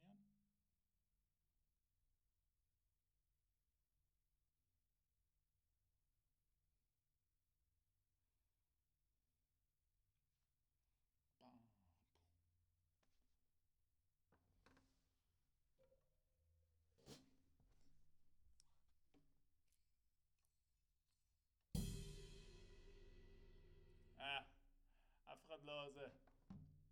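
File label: Snare Top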